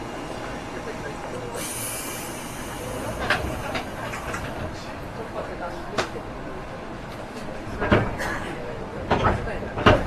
saikyo kawagoe 205

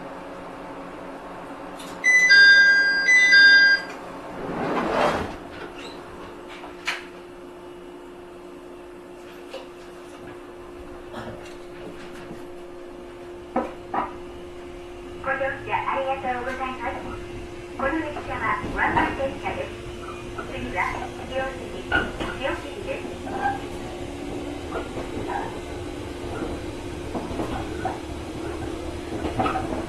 chuo 123, train

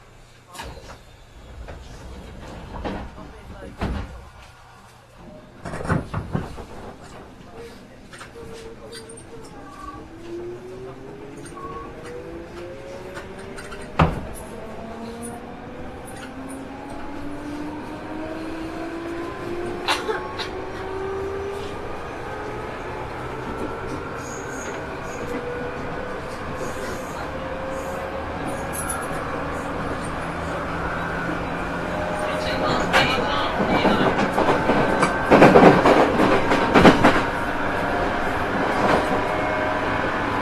musashino 205, train

